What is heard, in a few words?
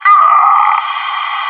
pain; maxpack